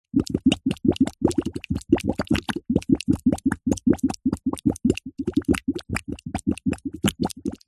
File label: wet mud air blow into small bubbles; foley